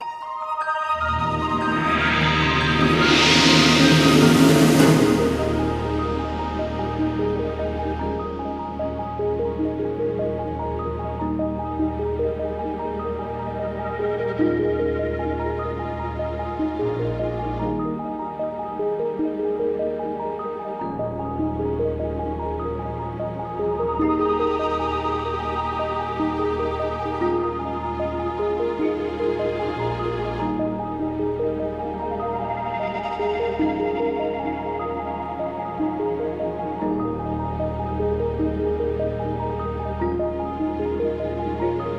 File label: Ident, music beds, affects and jingles